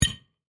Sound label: Click